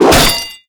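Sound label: arrow impact robot; melee impacts; Garry's Mod; tf2; mvm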